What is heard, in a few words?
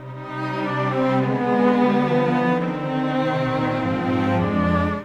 Strings; Sonic Foundry (Sony Creative Software) - Orchestral VOL-2; Modern 36